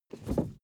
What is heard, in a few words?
suv 1st gear release fast; verkehr transport; autos